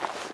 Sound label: Idle leather small